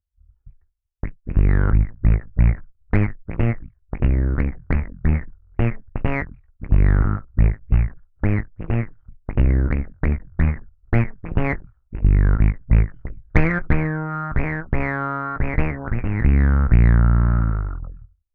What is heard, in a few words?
CHAMELEON SYNTH